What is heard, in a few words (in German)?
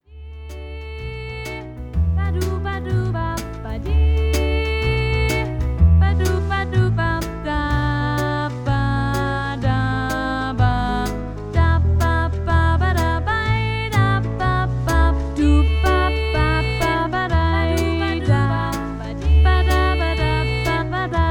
Sunday Kanon vokal